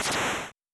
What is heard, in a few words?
explosion; SDL minesweeper